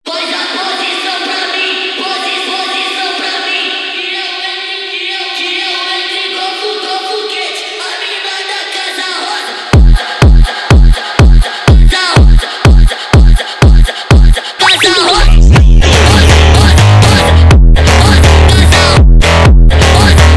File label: phonk, Speed Up